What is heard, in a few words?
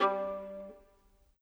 Viola, Orchestral; Sonic Foundry (Sony Creative Software); Orchestral VOL-2; Modern 09